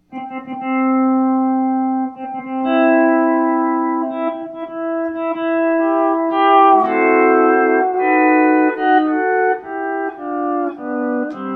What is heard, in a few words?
wedding march steel